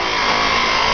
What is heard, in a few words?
GRINDER, 机器